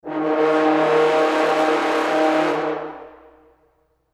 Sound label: fluttle horn